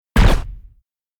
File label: silencer crunchy impact